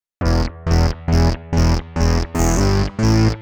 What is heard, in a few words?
Bass Loop 140; Audacity; loops